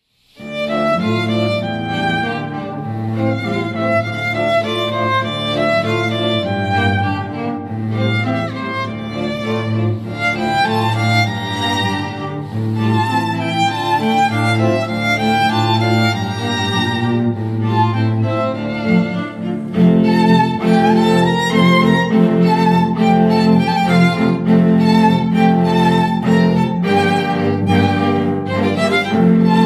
string quartet

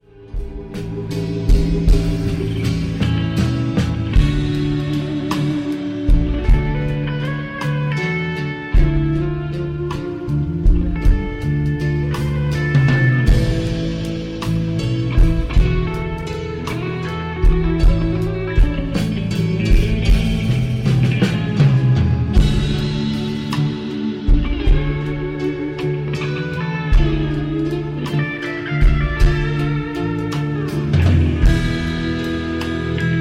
cover band

